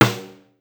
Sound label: Snare, Pitched Down; Clavia DDrum-AT